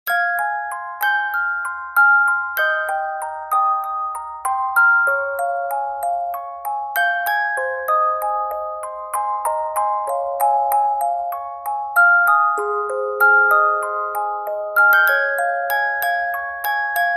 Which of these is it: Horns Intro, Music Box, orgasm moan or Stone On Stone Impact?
Music Box